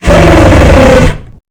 thumper chase; lethalcompany; zerosiki; slender

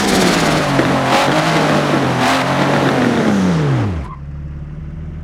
braking fast, nissan skyline gtr r34; cyn-cars

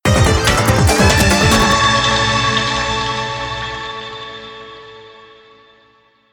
good end; typing; tf2ware ultimate

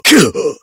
heavy pain severe

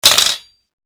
granny spawn; zerosiki; slender

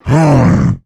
attack, male zombie; nmrih; zerosiki; slender

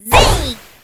lunge; freak fortress 2; hat kid

